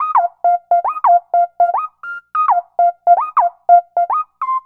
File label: Keyboard